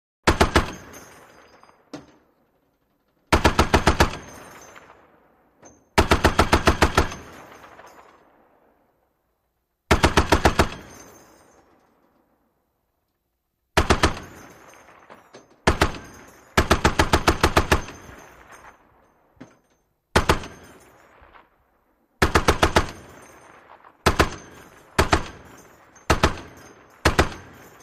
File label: .50 Caliber: Long Bursts With Shells Dropping